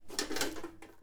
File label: lantern holster; Rust